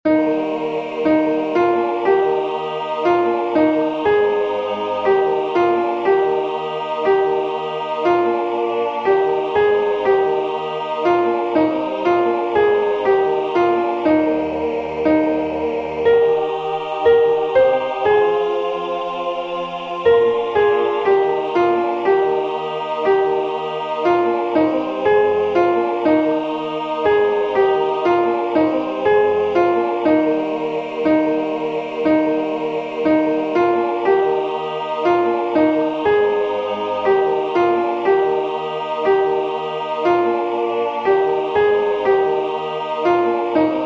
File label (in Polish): Sopran; Alta Trinita Beata